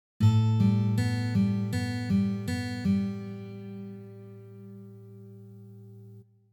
Drone Notes

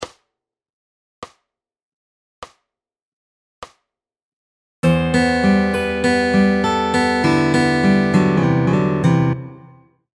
Fingerpicking Fun Superlick

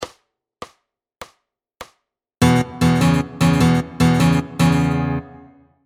Fingerstyle Blues Rhythm And Lead

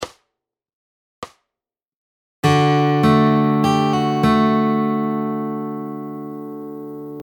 Fingerpicking Lick; Fingerpicking Fun

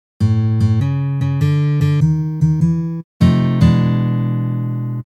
Blues Guitar Turnaround

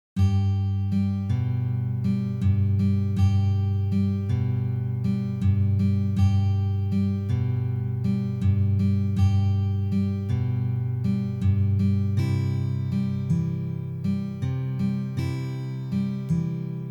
slow

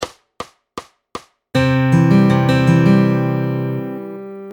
Fingerstyle 3 Chord Folk

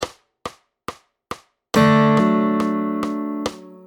Fingerstyle D Chord Hammering; Fingerstyle Fun; P3 Amazing D Chord

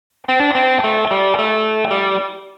Lick

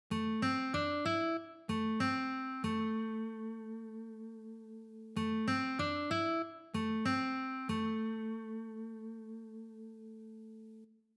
Riff

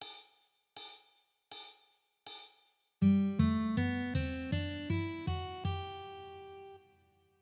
G Major Scale

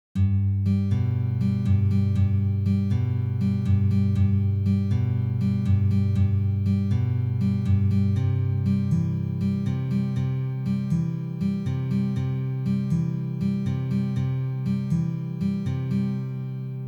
fast

